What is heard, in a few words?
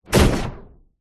science fiction cannon gun blast